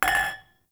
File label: Glasses Clink; Warframe; The New War; Zariman